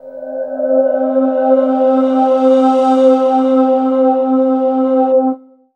pad C, Synth Pads, Chillout; Best Service ProSamples vol.36 - Chillout

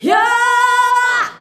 "YOOOAAH" B, FEMALES; Best Service; Hyper Dance

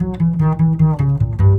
BASS, Drum & Bass, 150 BPM

